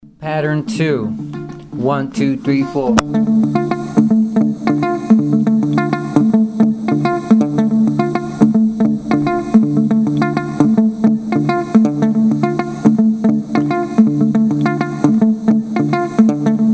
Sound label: mozambique patterns